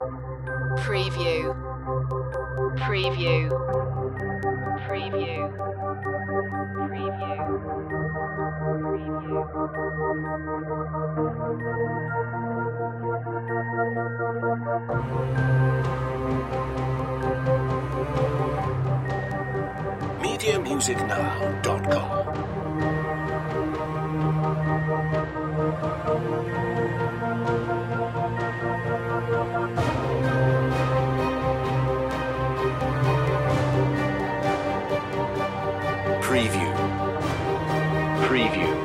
no piano

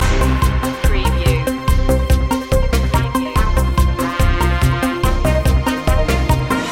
newsbreak loop

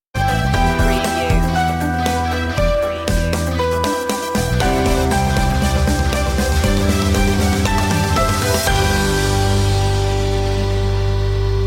flying high stinger

